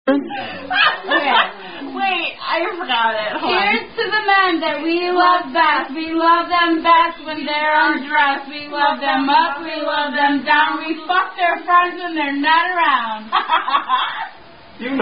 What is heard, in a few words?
Rugby Song, Bawdy Drinking Toast Done By Women